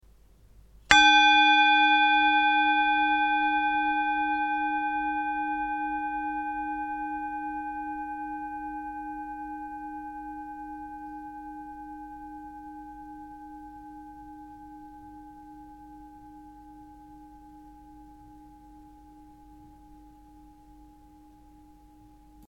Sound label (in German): Klangschale